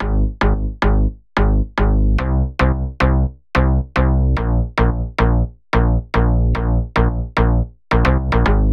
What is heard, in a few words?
Bassline G# 110Bpm, House; Ghosthack Advent Calendar; House Kit; House Construction Kit